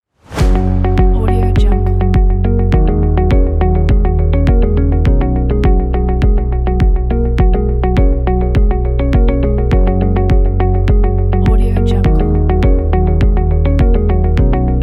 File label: corporate background music pack